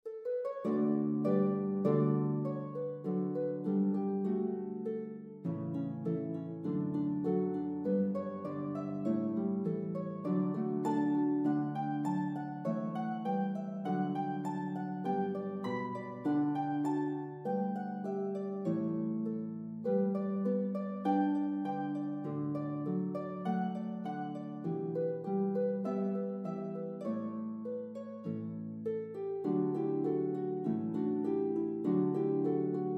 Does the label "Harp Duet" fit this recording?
Yes